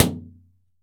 shower, impulse responses; howard fredrickson

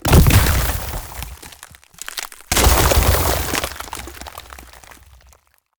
Ice Spell Explosion Impact Shatter Strike; Ice Explosions & Impacts; Arctic Aura

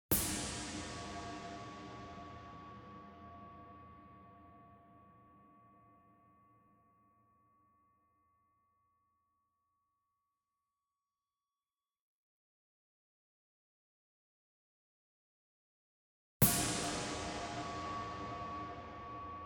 Thunder Sheet Hit Medium; SWEETENER; boom library; Cinematic Strikes Construction Kit

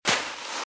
Footsteps Sand Dry Walk Right; RadwinIsland

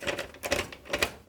Blinds Window Twist Loop; SFX Kit; Foley - Misc

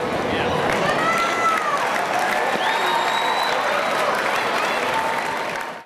Baseball Crowd Reaction; SFX Kit; Crowds

